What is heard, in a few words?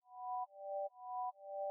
Siren Feeling